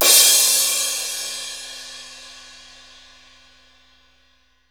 ZILDJ C; Keith Emerson Signature Series

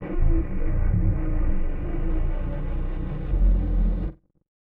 Malfunction Synth, malfunctioning synth samples

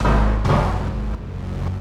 Ambient FX, Ambience FX

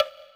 Jamblock, Yamaha PTX8

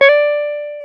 Jazz Guitar; Club-50 - Foundations; Jaz-Kessel

